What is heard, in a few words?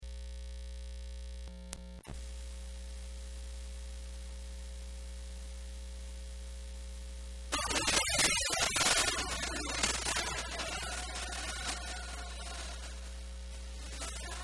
Tape Overdrives